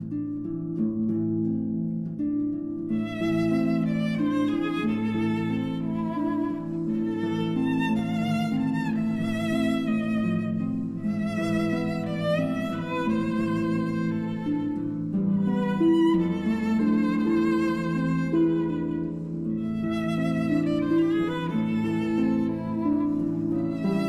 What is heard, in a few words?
Berceuse, for violin and harp